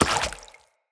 Root Spider Mother Step Water, Spiders

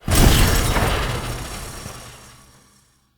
Pipe Destroy; Encounters; Ode Pollution Tower

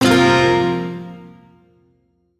Shawzin Pent Min Chord A; Warframe; Duviri

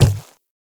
Infested Footsteps Quadruped Medium Walk Default; Warframe

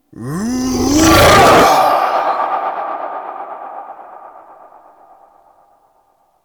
Focus Operator Cast; Warframe; Operators

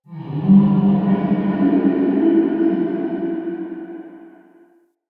Root Cave Distant Moan; Ambience; Neathuns Root Cave

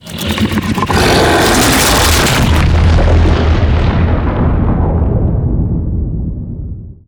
Octopede Phase Transition Burrow; Warframe; ManInTheWall

